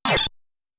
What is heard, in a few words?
Sword Sheathing; The Tower of Druaga